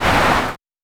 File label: Snow